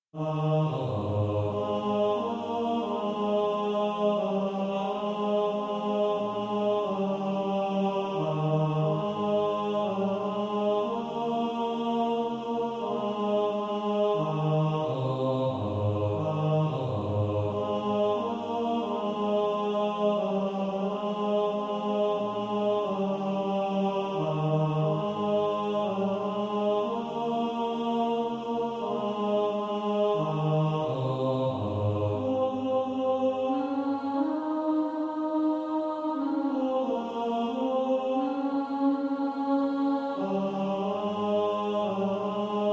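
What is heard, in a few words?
tenor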